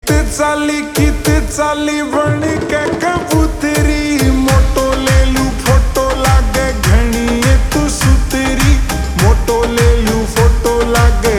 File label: Ringtone Slowed Reverb